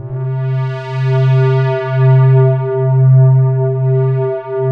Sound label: SD1 SQUARE, Synthesizers; Soli-Music; World of Synthesizers VOL-1